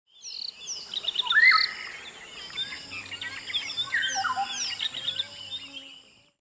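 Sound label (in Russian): сладкоголосая трель иволги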